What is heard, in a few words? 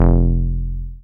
303, TB-303 bass